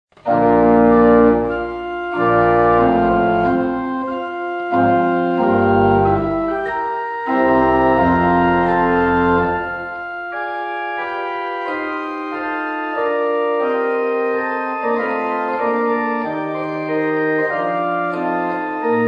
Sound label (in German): Vol in B Dur; Battishill